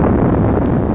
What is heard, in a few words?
rumble